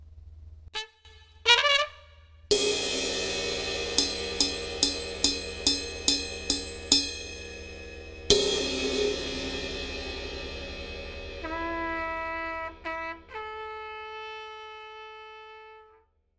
trumpet ride

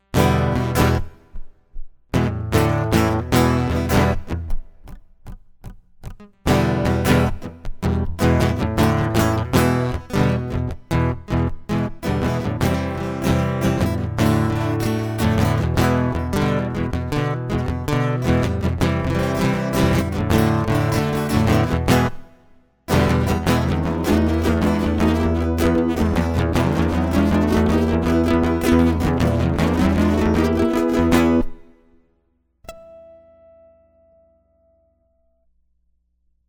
acoustic

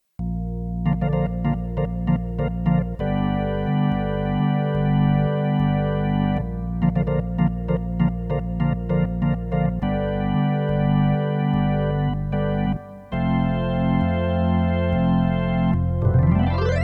pipe organ

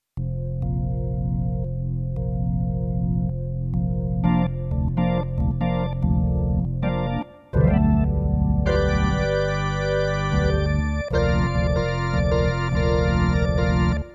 Organ

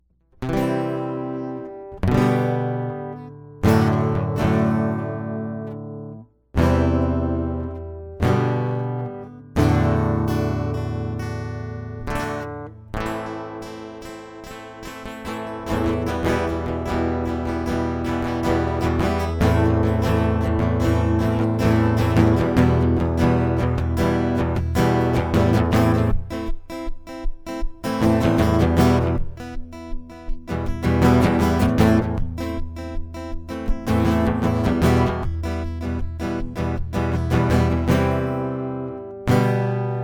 acoustic

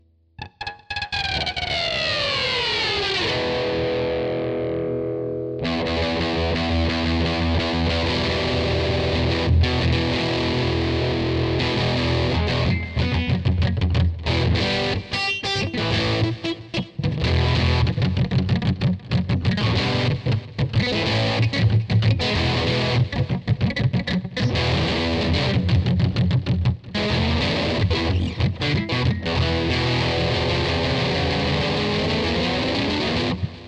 Electric; MegaEpix

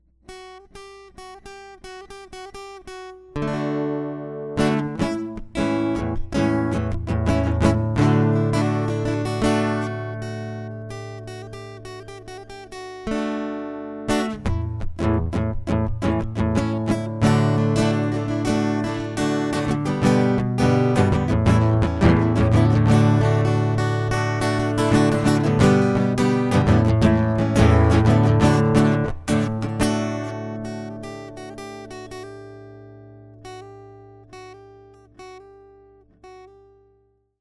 acoustic

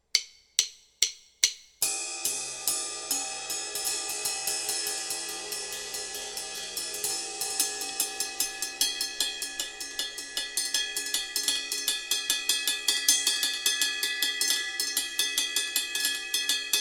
sticks intro; Did You Hear the News

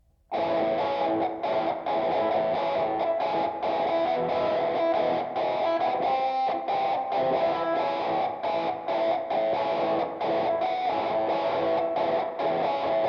electric

